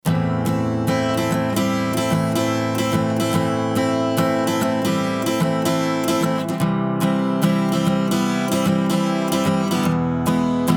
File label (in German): Gitarre; Tegeler Audio Vocal Leveler 500